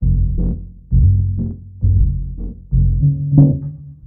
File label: Bass; Sonic Foundry (Sony Creative Software); Twine-IDM; Basses